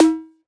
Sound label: Perc, Dubstep One-Shot Drums